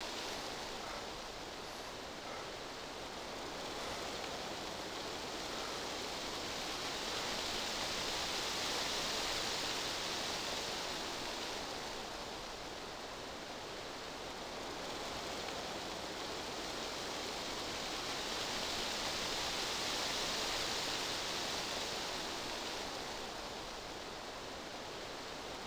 ambient night; dayz standalone

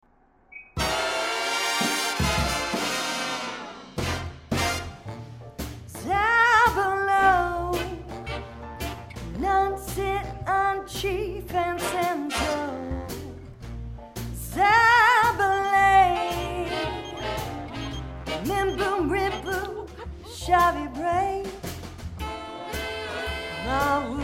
singing; sims 4; maxlvl

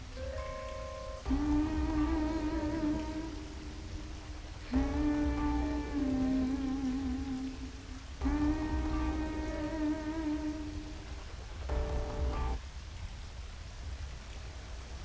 humming; Transistor

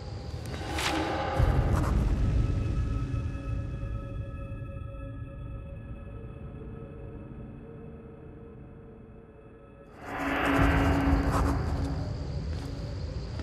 ui diary notes

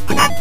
player extra life; kung fu master

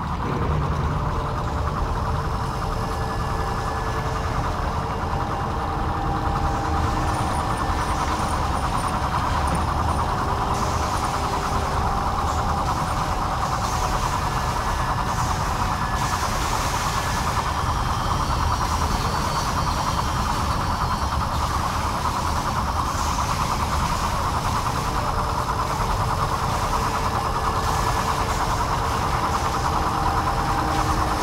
machinery transition achievement; limbo

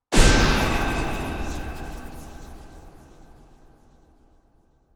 spell; hollow knight

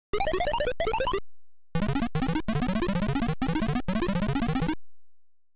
dial; punch-out

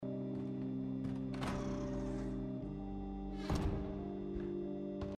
door; Neverending Nightmares; gamesoundopedia

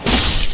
dagger hit; Dark Souls III

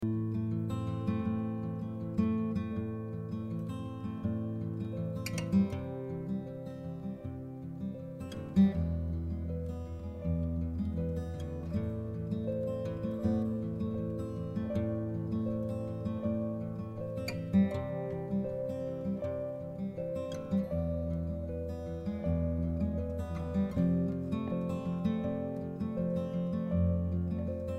walking road forest music; Life is Strange 2; gamesoundopedia

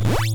hit; punch-out

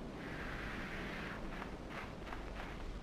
slide; limbo

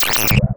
portal invalid surface; portal 2

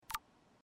sms back; life is strange

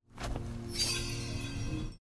upside down; gris

